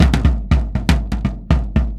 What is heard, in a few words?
DRM; Best Service ProSamples vol.52 - World Instruments 2; AKAI; MIX DRM LOOP